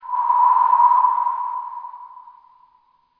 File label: wind, nordlead2